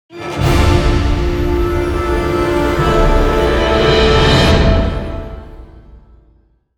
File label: stinger fort destroyed player; heroes of the storm full gamerip 2015